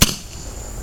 Firecracker In Small Pot

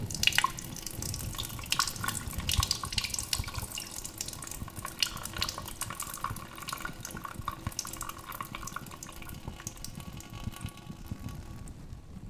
Peeing

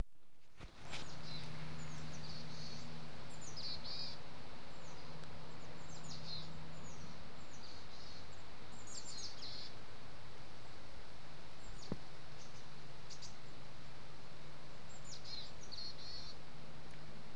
Birds Calling

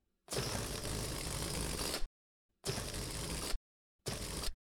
Deflated Balloon

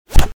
Bullet Zip And Hit

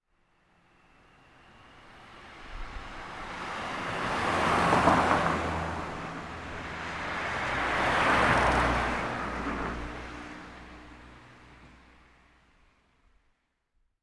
Car Coming

